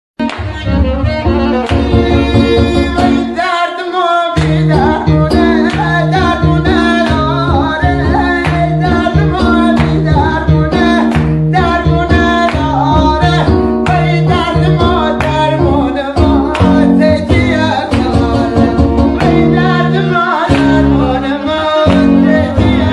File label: lori ghamgin khoram abadi